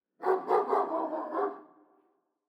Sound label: ambience dog; netent